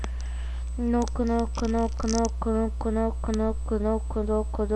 knock

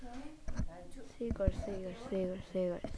seagull

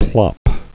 plop

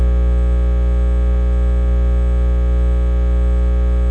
hit

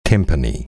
timpani

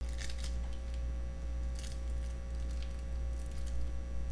sharpen

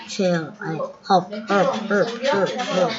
harp

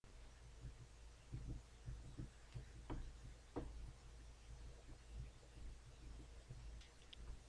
harp

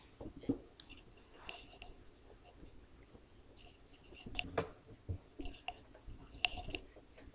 sea

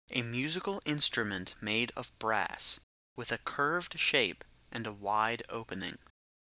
saxophone

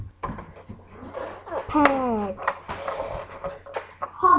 pad

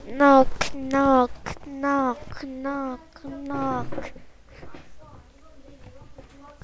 knock

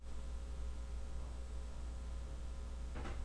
glass

